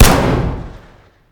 fire dist int pistol; gmod; arccw uc commons attachments

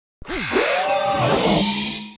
dodge; tridroidznnpc

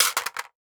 rifle magdrop; gmod; arccw uc commons attachments

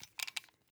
movement pistol; gmod; arccw uc commons attachments

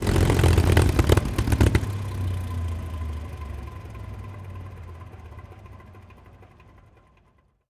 engine stop; p51d; planes; gmod; lvs planes

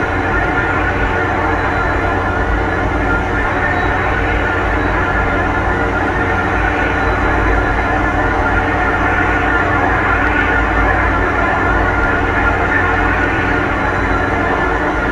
engine; vwing; v wing v 19

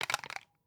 movement shotgun; gmod; arccw uc commons attachments

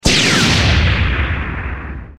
fire missile; gmod; lvs star wars

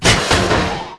door open; gmod; lvs star wars; laat

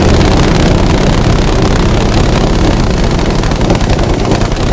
mg loop interior; planes; gmod; lvs planes; weapons

